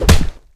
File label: knockback, player attack; Minecraft; Votive World